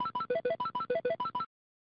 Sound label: Unused Sound